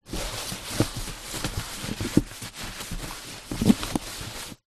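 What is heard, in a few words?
packaging thin wrap movement inside empty cardboard box; zapsplat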